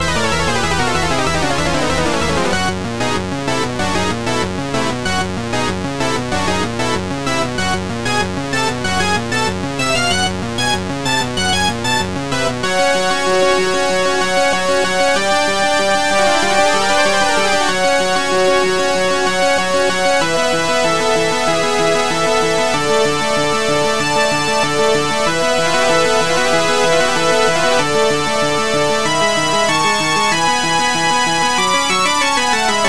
Trainer Battle Sawtooth Lead Remix; Pokemon RBY

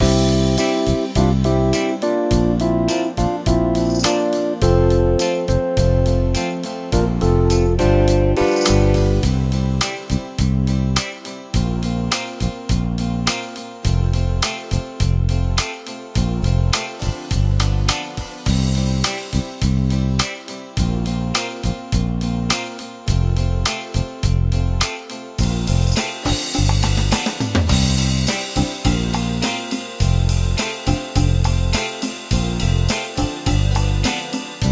8Beat; PSR-E473; Song recorded on PSR-E473

